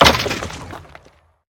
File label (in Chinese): 凋灵骷髅死亡声